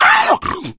hunter pounce miss, voice

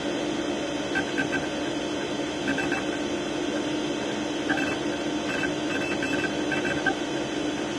computer working, ambient; Source Engine; tf

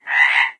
frog, frog groups; ambient; random animals; Left 4 Dead 2; Source Engine

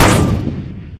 airboat gun energy; Source Engine; video game; weapons

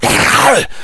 rage at victim, infected, male

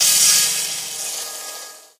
lightbridge elec, footsteps

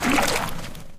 paint blob splat

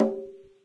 jungle maximize; windows 9x; sound schemes

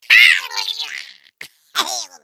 jump spin, taunts; player

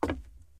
wood, footsteps, survivor, run

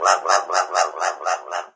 swamp bird; ambient; canals; source engine; hl2; video games

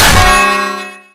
taunt smash; video games; player; eng; source engine; tf

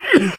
cough, survivor voice; source engine; left 4 dead 2; namvet

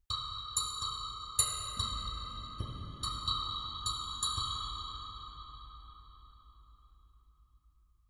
ambient synth grain click

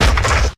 giant heavy step; source engine; tf; mvm; video games

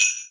xmas sleigh bells

